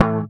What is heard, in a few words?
A, Bass; Sampleheads; Will Lee Bass Library